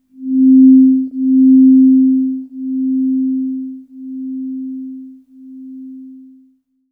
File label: C3, Synth Line